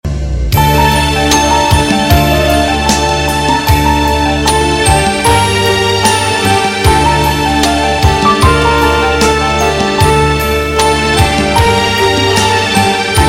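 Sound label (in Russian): иранская музыка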